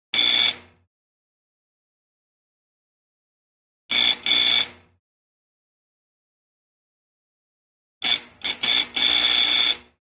timbre de puerta; efectos

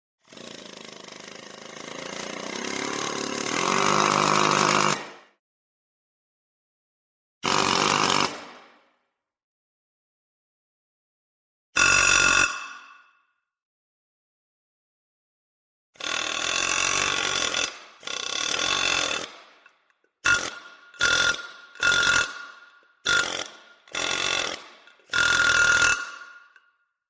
taladradora pavimento; efectos

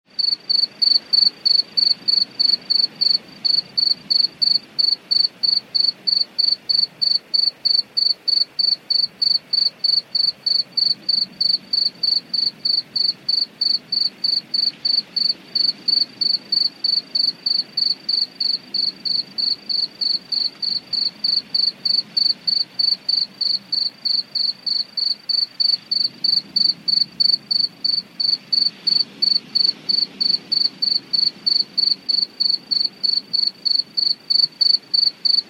grillos cigarras - Insectos; efectos

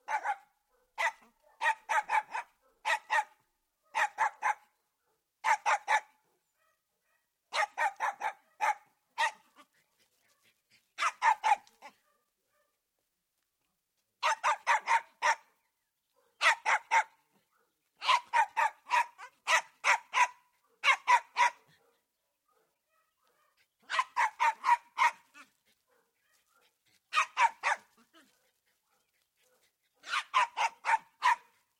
perro pequeno ladrando; efectos

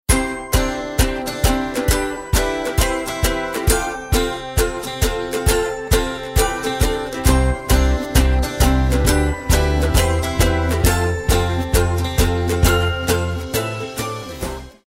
Corporativo, Musica

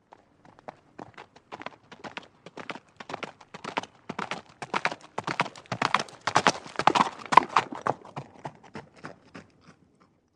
caballo galope; efectos

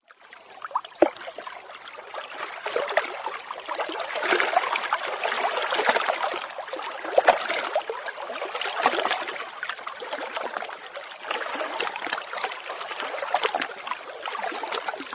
olas del lago chapoteo en roca; efectos

